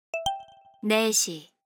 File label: Hourly Alert; SKY IM-U680L